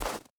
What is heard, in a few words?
boar footstep snow hard; Rust